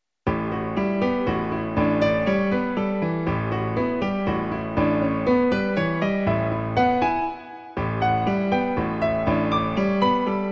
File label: Piano Sonata Movement 3 Trio